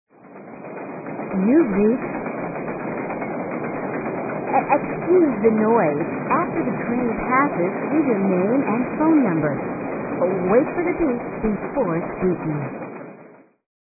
Railroad

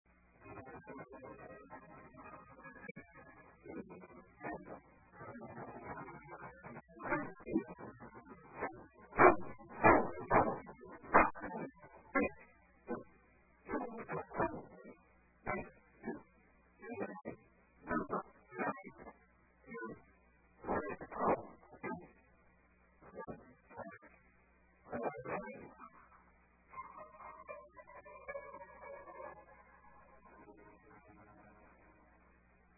Piano Player

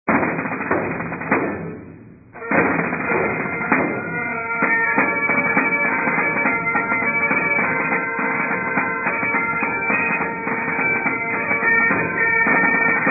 Bag Pipes

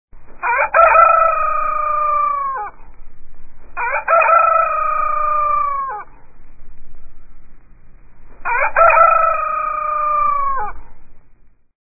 Rooster Crowding

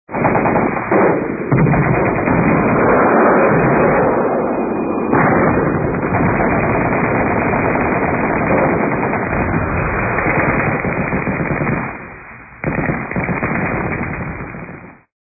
Whistling Shells, Answering Machine Messages

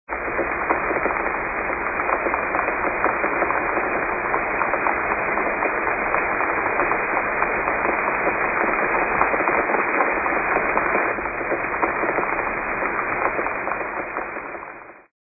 Rain on Leaves

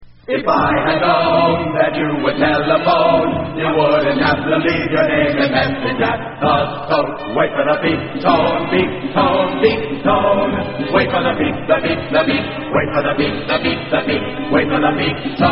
Sousaphone; MusicalMadness

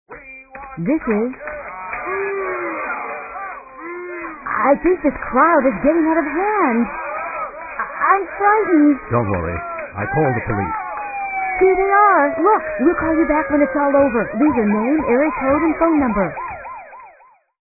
Rowdy Crowd

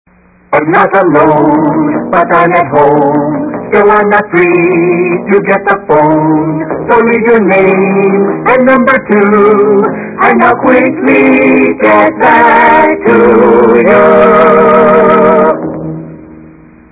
orchestral pops; RadioShack

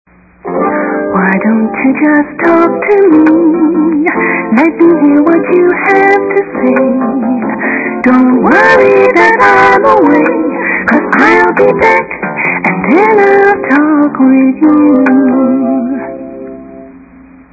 soft contemporary; RadioShack

